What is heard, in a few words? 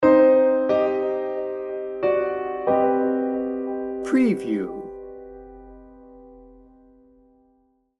dark shadows sting bumper